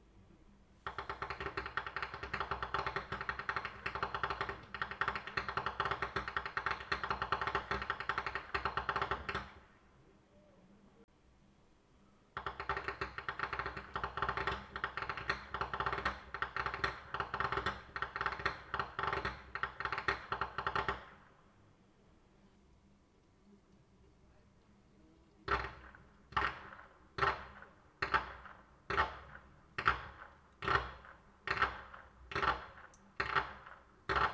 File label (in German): schnalzen; saaldorf